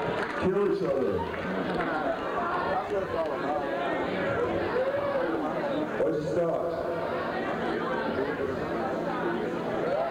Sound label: crowd; Concerts; 1986-04-11 Eagle’s Lodge, Colorado